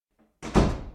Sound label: door slamm